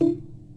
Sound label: blunt, Synths